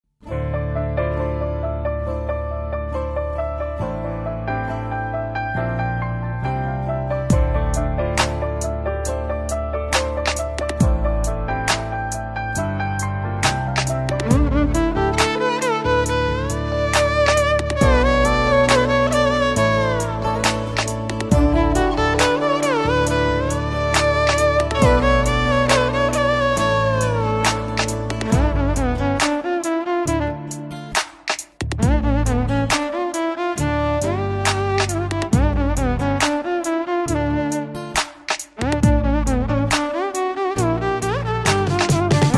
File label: Instrumental Ringtone